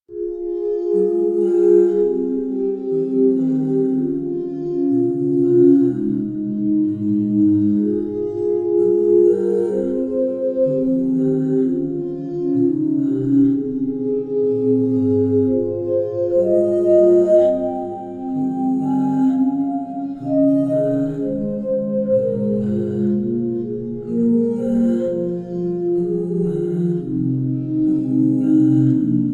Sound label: glassroom